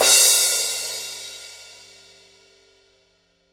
Paiste 2002 16 thin crash stroke

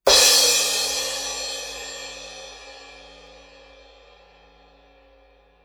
Sabian 16 AAX Metal crash

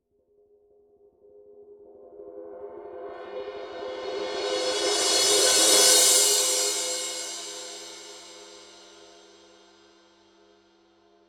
Paiste rude 17 thin crash swell

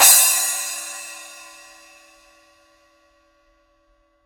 Paiste rude 10 splash edge

